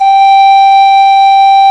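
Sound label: pan flute G4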